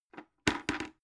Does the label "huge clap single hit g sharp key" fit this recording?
No